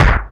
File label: Kick, Alesis DM5